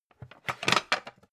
pan stack

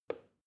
plastic button start release soft

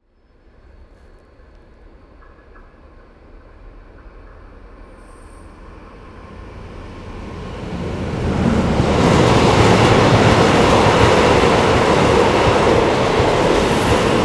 freight train passing in train station

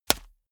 small punch fleshy hard

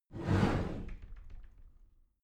cupboard slide door slide heavy

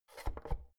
oven knob press out

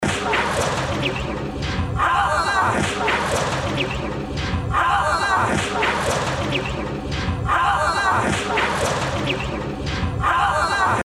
danger fx loop

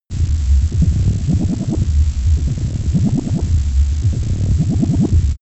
space alien machinery pumping

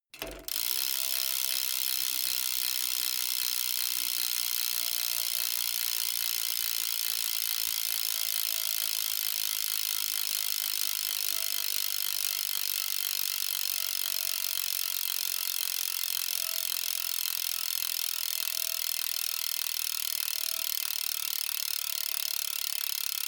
bmx bike freewheel coast

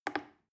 plastic button little click soft fast

